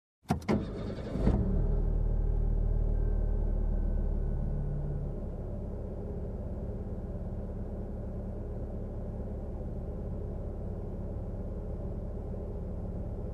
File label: Car start and engine sounds from cockpit